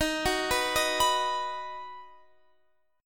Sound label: Eb Minor Sharp 5th